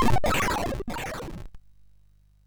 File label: sid MOS6581R2